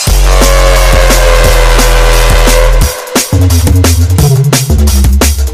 Bass

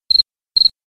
Cri Cri Short

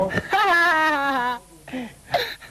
Sarcastic Laughter

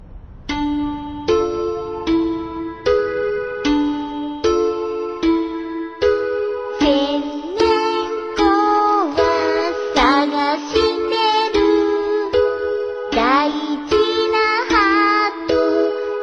Song

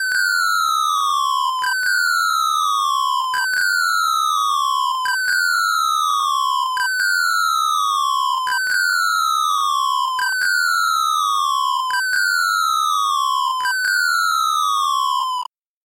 Fire Alarm

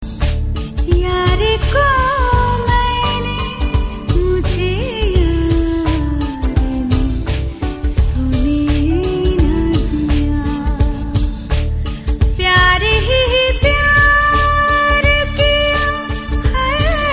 Female

